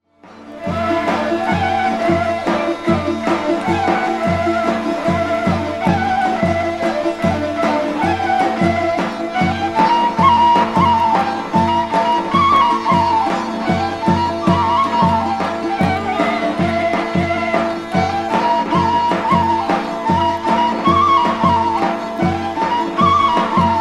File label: Ovcepolsko Kaval